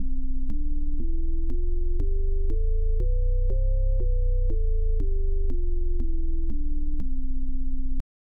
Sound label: c major scale bass click harmonic imperfection wheel imperfection, hammond